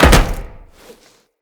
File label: Tenno Land Heavy Metal, Footsteps; Characters